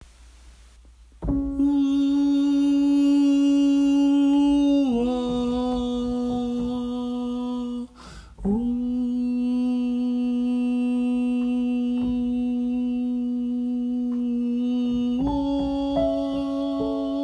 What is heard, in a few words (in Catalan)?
Cançó de mar TENORES